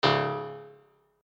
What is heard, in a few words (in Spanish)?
Fallar Disparo; SFX Gameplay 3